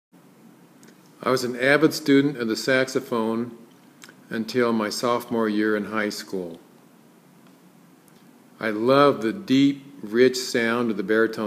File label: Baritone Sax